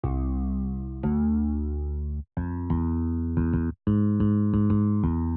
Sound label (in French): compo msm basse